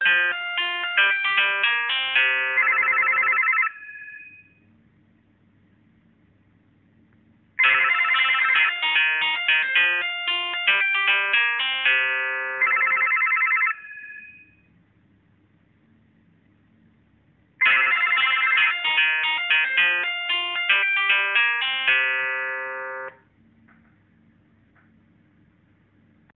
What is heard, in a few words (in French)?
sonnerie telephone ecole